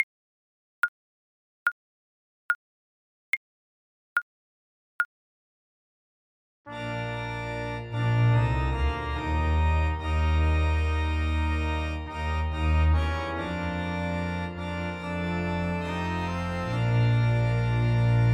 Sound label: how great thou art, keyboard